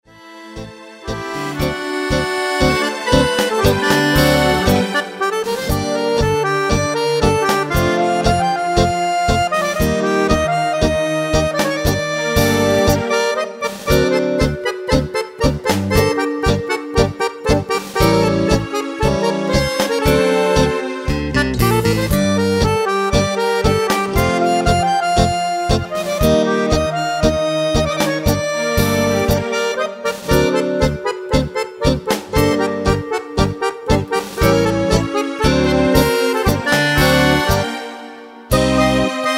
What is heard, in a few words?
tango